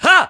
Jump Attack; Link's jumping attack

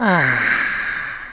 Sigh; Hanch (Sera's husband) sighing

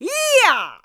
Sage Cheer; Impa; The Sages cheering Link on

